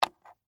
Game Piece On, Sports, Board, Game, Plastic, Piece, Place, On, Game, Board, Hotel; Monopoly